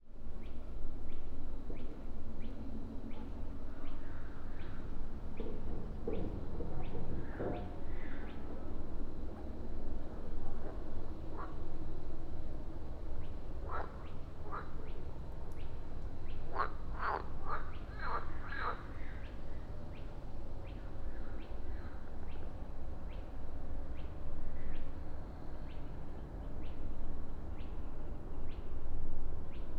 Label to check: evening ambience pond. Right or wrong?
Right